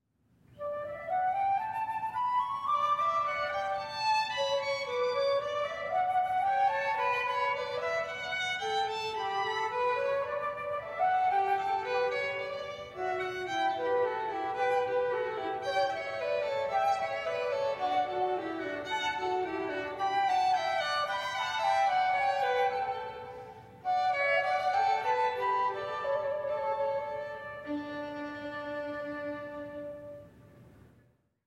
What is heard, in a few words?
Sonata in D for Flute and Violin; Telemann